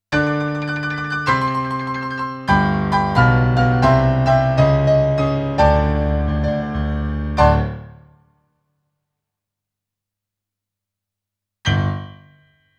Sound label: PIANO, RNR for piano